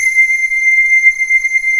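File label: JV square lead, JV-1080; Syntec; Wall of Sounds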